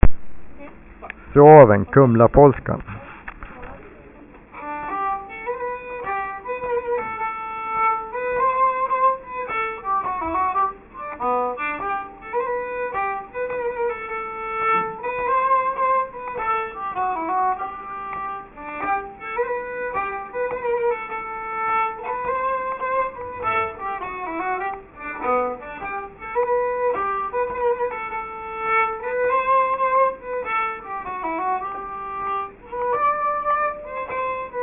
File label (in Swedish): Polska; efter Zuaw; Arkivinspelningar